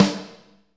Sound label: SNR, Drumscapes Roland